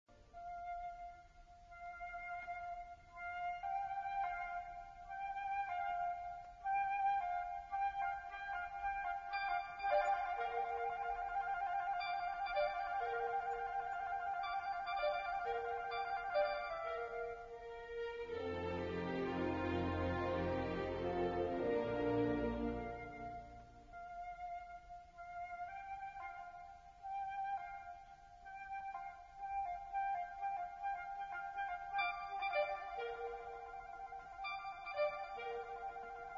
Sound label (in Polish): Scena nad strumykiem; Beethoven - VI Symfonia F dur op. 68, cz. II Andante.